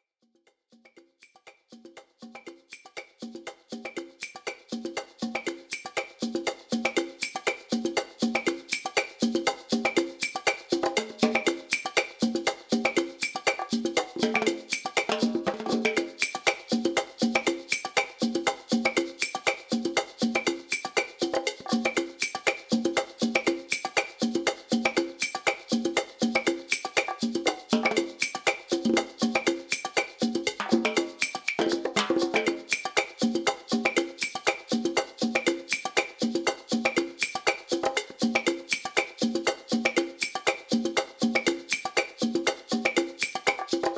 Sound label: Coconut musician drum solo; psubhashish